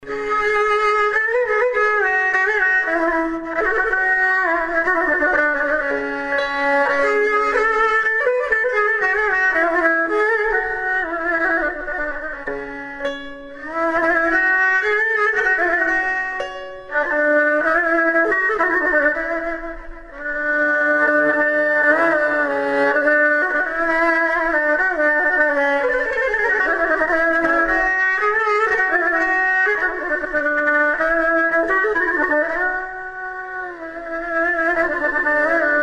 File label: KAMANCHEH with FIDDLE